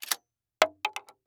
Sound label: Weapon Bullet Shell 12 Gauge Eject Shells On Boardwalk; AdobeCC